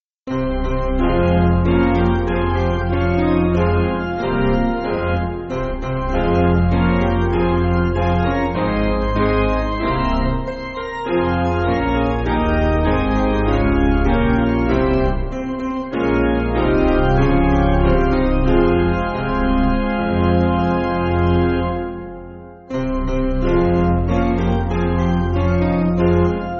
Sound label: Piano